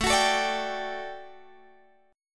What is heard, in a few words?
D-Major Add 11th A down Guitar Standard; strummed; JGuitar